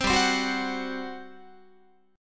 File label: G Major 7th Suspended 4th, Guitar; GM7sus4; strummed; 15,15,15,16,16,17